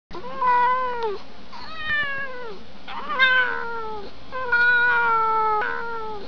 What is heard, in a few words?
leopard cat; worldofcats